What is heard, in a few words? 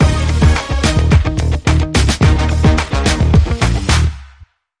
Swing